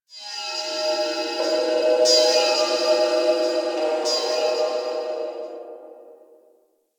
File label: Exterior Organic Drones, Ambience; Warframe; Rail Jack